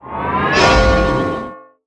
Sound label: summoner skill sleep; MUDevs S20.1-3